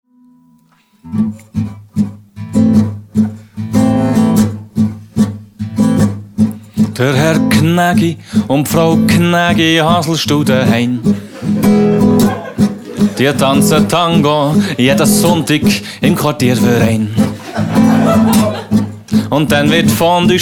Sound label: Tango